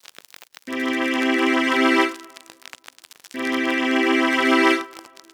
Violin Bari; Hip-Hop

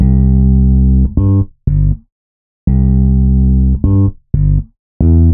Slap Cut

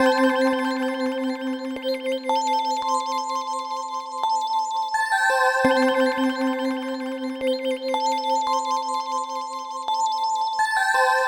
Fresh Bells

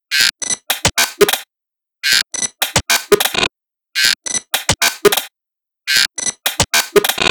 Zippel SFX

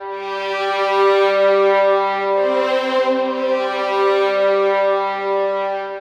Lyric, strings

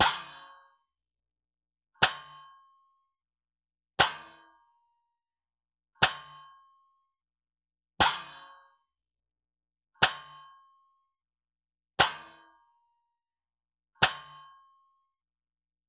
Hand Cymbals 120 BPM; перкуссии percussion loops

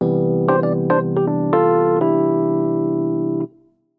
Groove, jazz keys; джазовый

